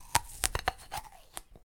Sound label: Biting Into Apple